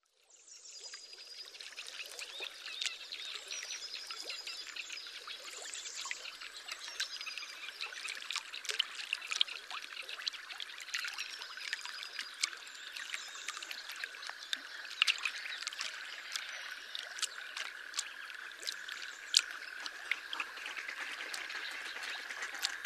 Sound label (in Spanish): el lago